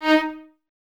String Ensembles, Violas, VLS Detache

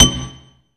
HAMMER BL, JV-1080; Syntec; Wall of Sounds

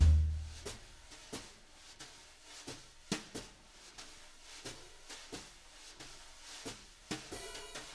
BRUSH LOOPS, Living Drums; Sampleheads; Peter Erskine

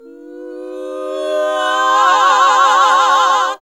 HAL GOSP. MIXED

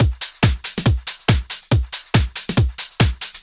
Addon Loop, Drum Loops 140bpm, Perc Loops

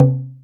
LOG; Best Service Advanced Media Trax Modern Composer

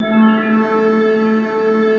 ATMO SYNTH; AKAI S6000 CD-ROM - Volume 1; SAMPLE WAVE MIXING 2; GALAXY

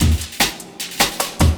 LOOP, 150 BPM, Drum & Bass

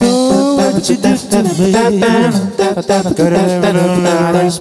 VD BEATV 1, Roots Funk; USB Soundscan vol.41; AKAI

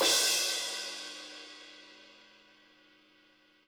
HiHat & Crash, Roland TD-20; HiHats

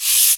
cabasa; E-MU Producer Series Vol. 7 – Old World Instruments

